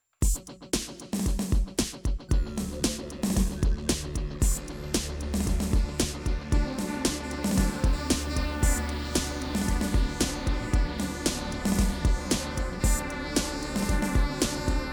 Alien Nation Instrumental

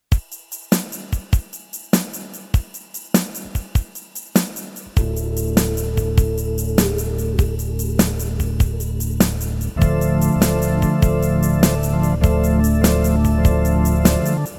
Instrumental

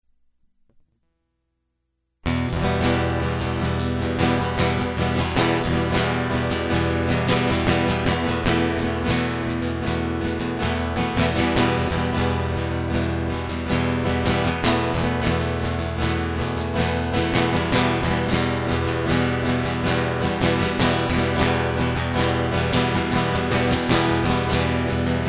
Guitar Loop

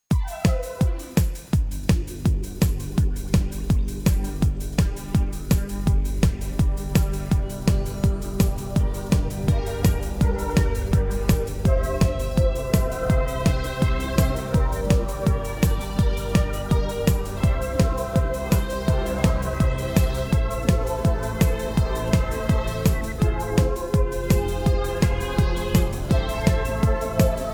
Instrumental

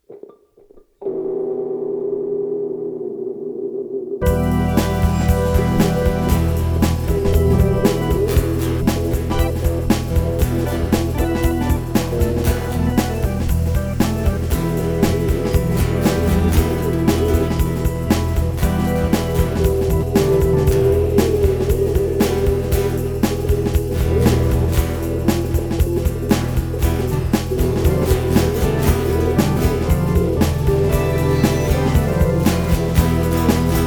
Ode to the Russian Conscript Instrumental

